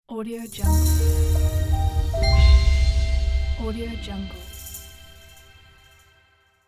clean motivational logo; italian style

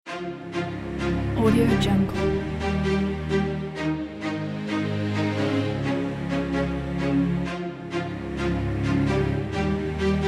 upbeat cinematic happy pack

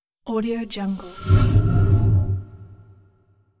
short fast whoosh; hollywoodedge

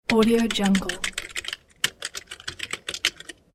keyboard typing

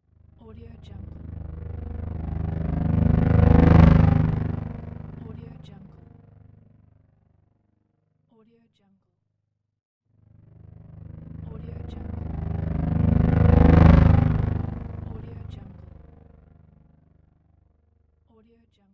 motobike; color cube sounds

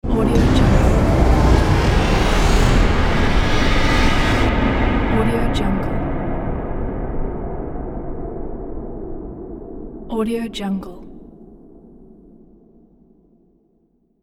wizard magic ambience

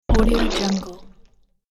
yucky goo puddle

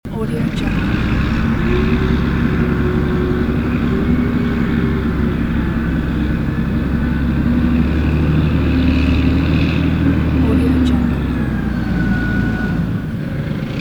distant earthmover working loop

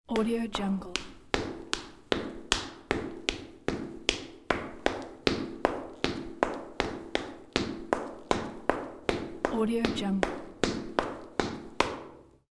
walking in leather shoes

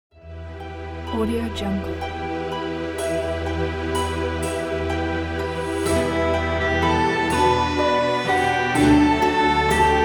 medieval village

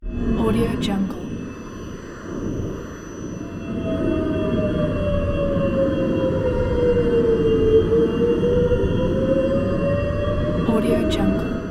scifi scary ghost

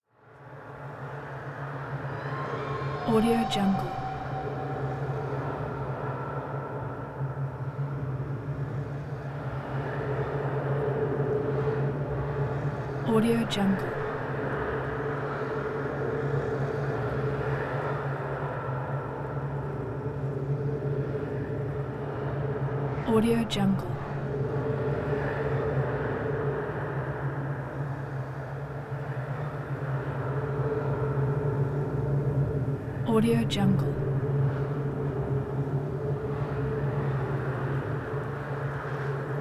tube wind whale cry swirling elements

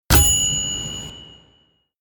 typewriter cash register bing sound

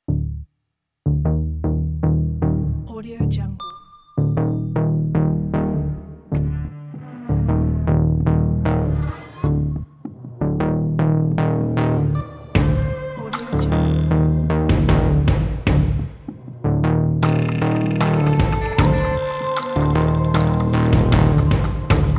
futuristic advertising

